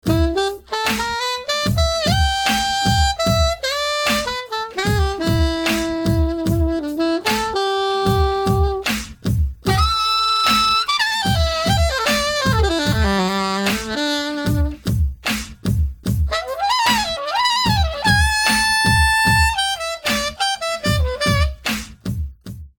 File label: Sax